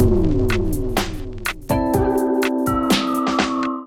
Kit Melodic 124 bpm, Drums Complex; MIDI Clips; Numerology; Ableton; Chop and Swing

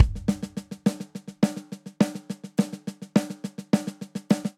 Country Buildup 209 bpm, Drum; 4 4; MIDI Clips; Ableton; Factory Packs; Drum Booth

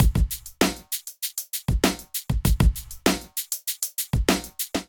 Kit groove 89 bpm; MIDI Clips; Emperor; Ableton; Drum Essentials

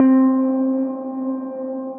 Virtual Keys; Broken Keys

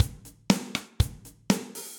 Kit Ambient Loud Punch, Drums; MPE Kits; Ableton; Session Drums Studio

